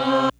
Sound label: Mellotron; One-Shot Synths; Crowdsourced Sample Pack; TRUTH-HZ